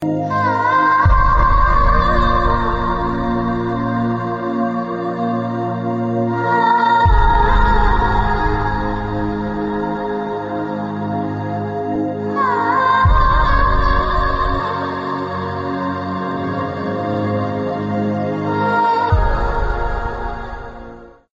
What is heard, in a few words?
sadness ringtone